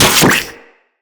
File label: Venom Tick; Warframes; Saryn